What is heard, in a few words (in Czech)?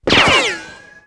kulka; Mafie 1